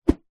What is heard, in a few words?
metal pole swing in air whoosh; foley